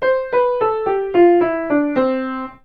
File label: Piano Play Scale Down; SFX Kit; Foley - Misc